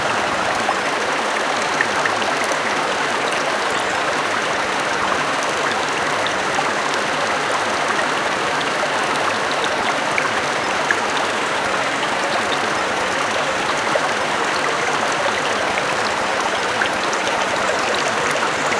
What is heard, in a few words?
natural stream sound, birdsong